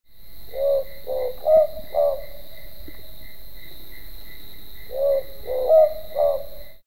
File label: ninox boobook